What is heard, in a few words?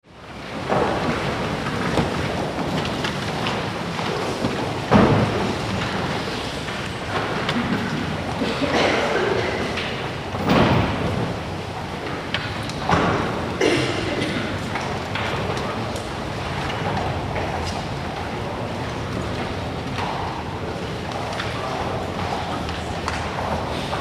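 church crowd noise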